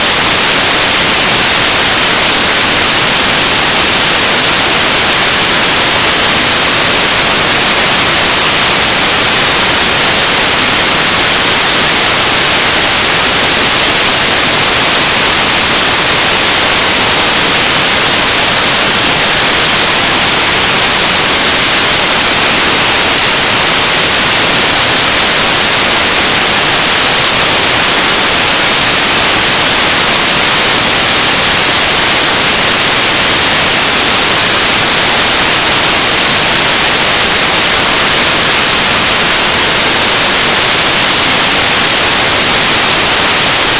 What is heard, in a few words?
noise